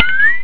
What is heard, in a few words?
BLOCK; pang